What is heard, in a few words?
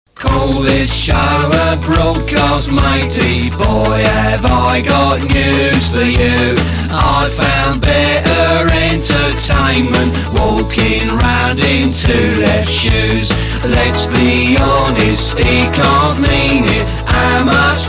ode 2 joy